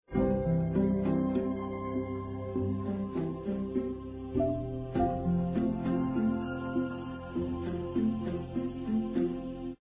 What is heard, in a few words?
background